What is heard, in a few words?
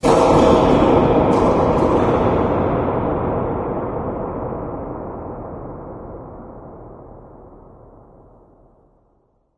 amb rock fall, ambient organic; video games; source engine; portal2